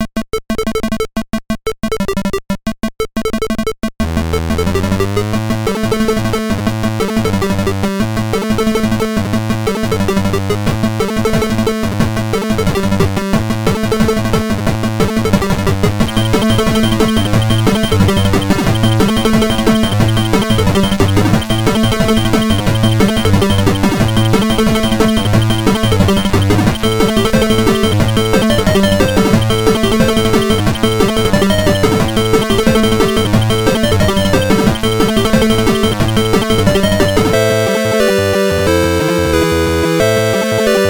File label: POWER noise